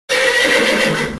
Angry Horse Black

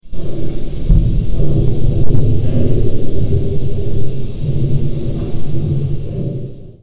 Empty Warehouse

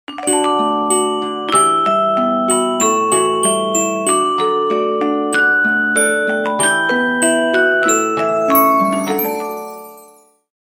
Sms Bell

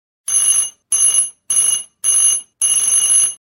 Bicycle Bell

